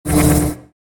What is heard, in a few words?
Robot Sound